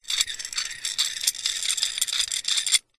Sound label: ancient game metal thin strip twist sequence squeak crank gear open slide texture